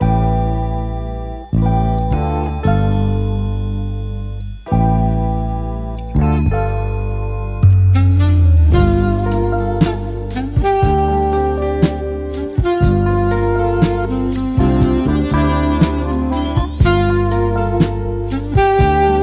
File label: Instrumental